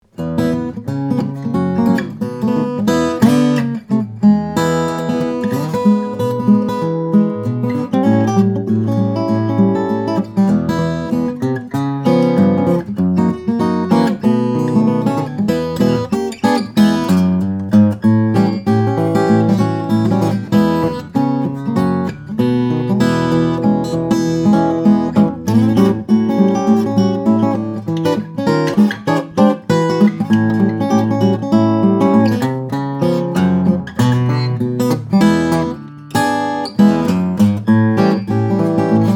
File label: maple, steel string guitar flattop; posch nl-maple